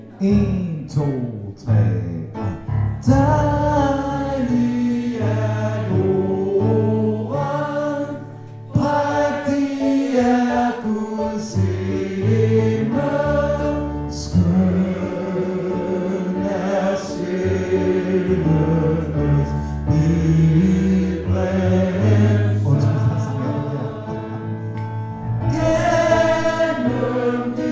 dejlig er jorden tenor

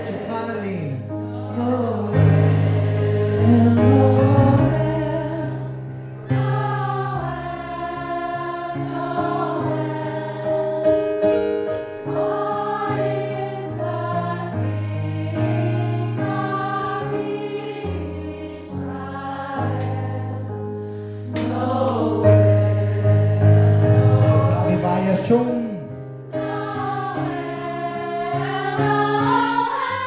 sopran; kor

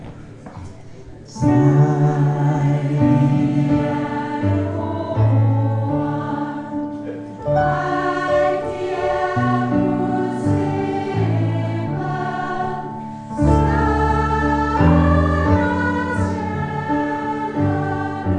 dejlig er jorden sopran

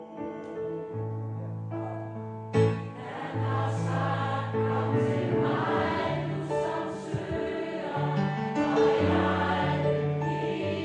velsignelsen sopran

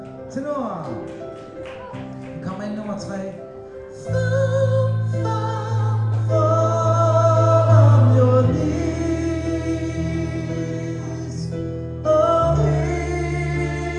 tenor, kor; fall on your knees

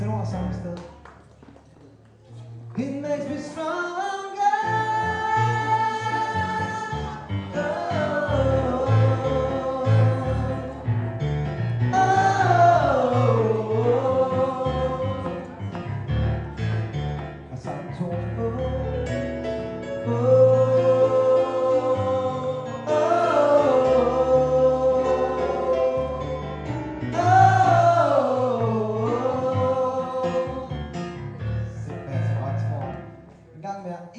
tenor, kor

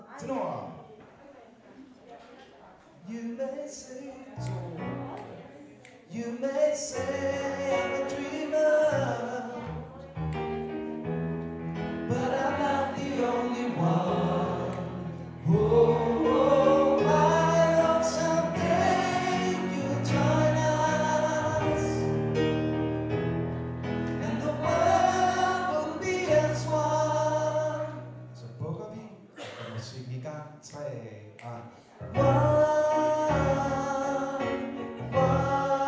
tenor, kor

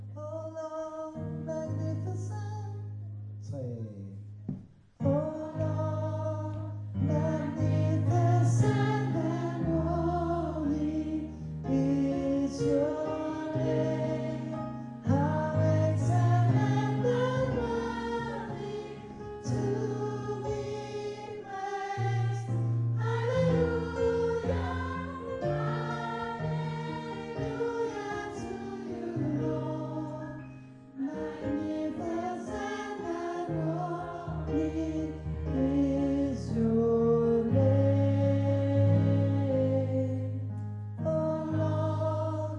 sopran, kor

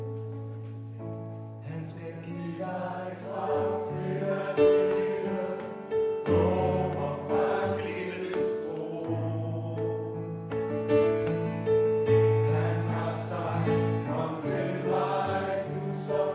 velsignelsen tenor

